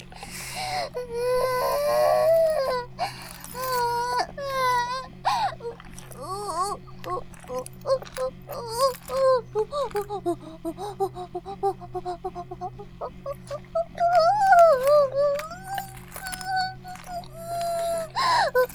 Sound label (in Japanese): バイブ放置, ASMR